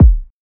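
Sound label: kick oneshot percussion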